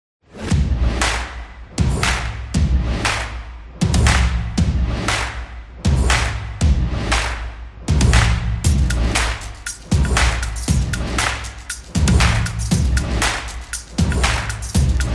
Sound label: Upbeat Intro